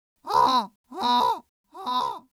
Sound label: aggressive goose honks; emerald-crossing